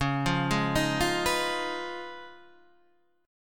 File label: Db 9th Db down Guitar Standard; arpeggiated; chord shape; JGuitar